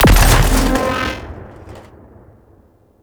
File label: Reinforce Beacon Death Explosion; Warframe; Venus; Reinforcement Beacon